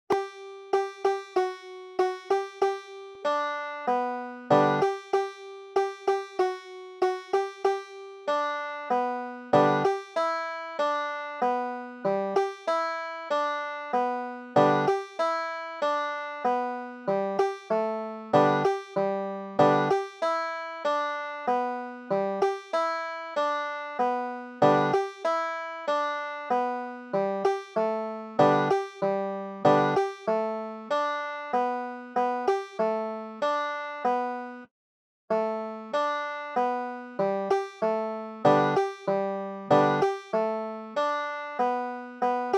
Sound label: banjo